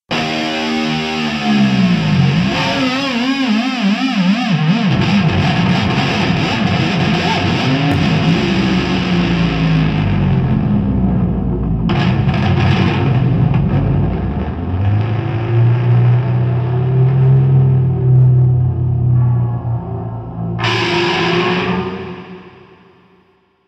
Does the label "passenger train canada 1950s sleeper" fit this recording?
No